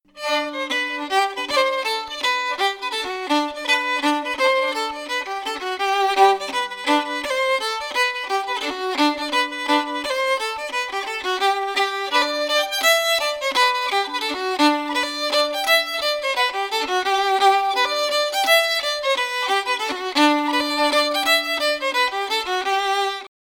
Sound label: fiddle; keel row; SeamusGrantFestival